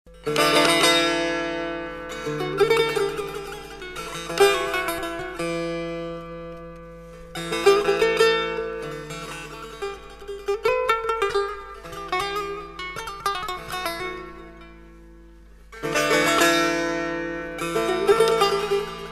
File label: setar